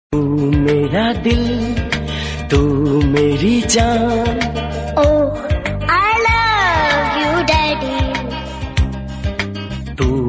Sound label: hindi; ek villain returns